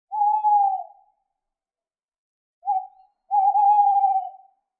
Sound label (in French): chouette hulotte; COMPAGNONS LUNE ROUGE